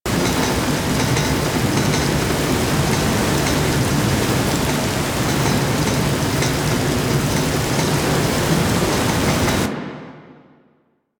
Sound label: Water Pipe Burst Loop; Warframe; The New War; Narmer Outpost